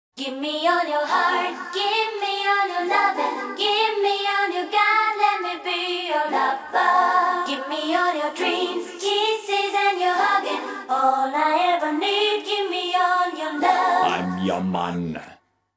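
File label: chorus accapella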